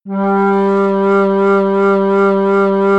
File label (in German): 800Hz Floete